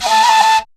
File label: FLUTE GRV; Best Service; World Colours